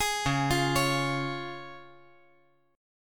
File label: C sharp Major